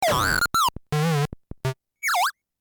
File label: bleep tone